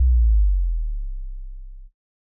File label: sub drop short; fx; bespoke - Floral v.1